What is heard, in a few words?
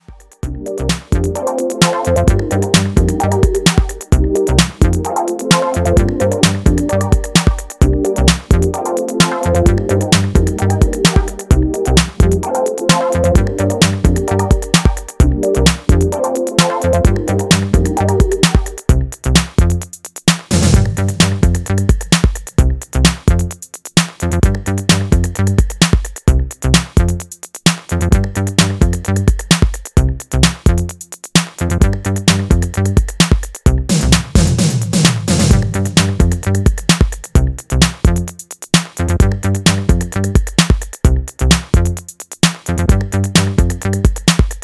instrumental